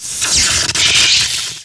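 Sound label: shock, magic; The Elder Scrolls III - Morrowind; Morrowind GOTY GOG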